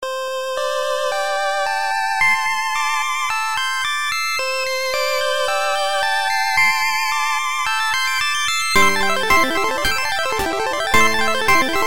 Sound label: Heart Beaten; Hiragana Pixel Party